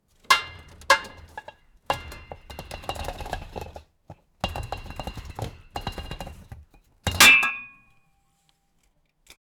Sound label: stone carve